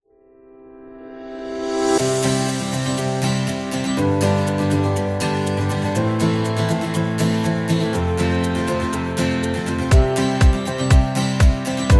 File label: Upbeat Indie Folk Background